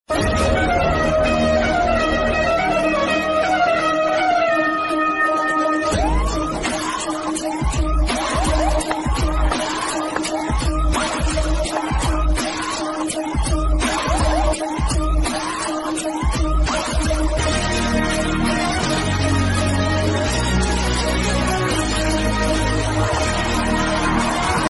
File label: movie theme bgm ringtone south movie bgm ringtone; Chiyaan Vikram; Pa Ranjith